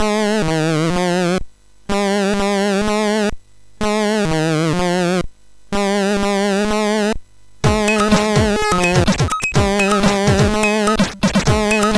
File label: Mix, MOS6581R4; HVSC; Noisy Pill